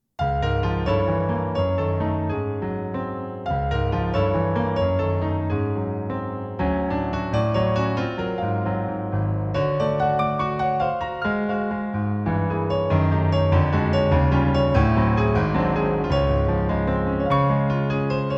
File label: melody, piano solo music, romantic poetic